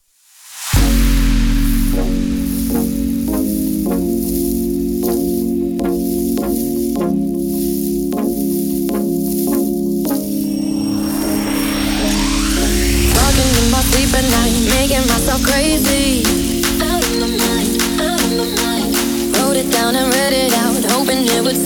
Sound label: Futurebass remake